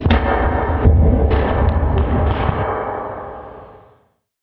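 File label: Deep Low Impacts Reverb, stinger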